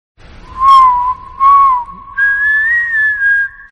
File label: whatsapp notification whistle